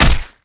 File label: hit